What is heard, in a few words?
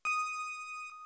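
High pitched note; pads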